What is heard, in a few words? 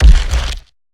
Climb Base; Evolve; Behemoth